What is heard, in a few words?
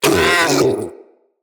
Vocal Pain, Hunting Critters; Characters; Predasite